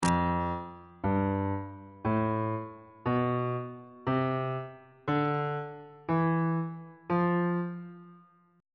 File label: e sharp lydian mode note positions on bass clef